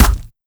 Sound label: bullethit player